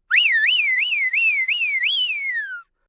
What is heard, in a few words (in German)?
Pfiff